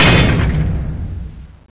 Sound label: metal crash